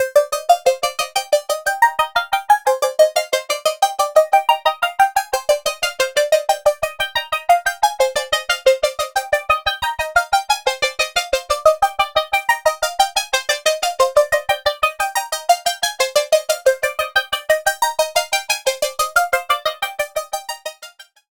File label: Cherry Audio GX-80 Rhythmic Squares